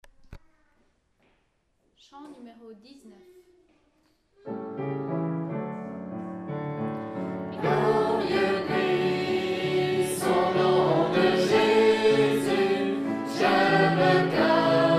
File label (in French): Chant; FR